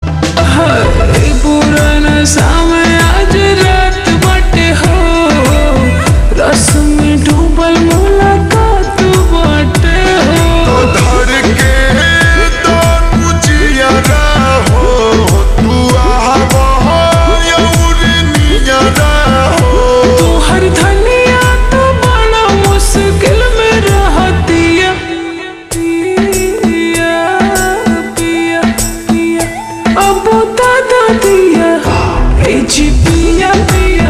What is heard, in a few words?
Ringtone Slowed Reverb